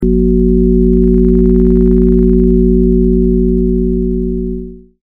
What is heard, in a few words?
BELL